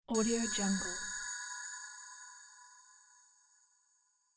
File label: diamond sparkle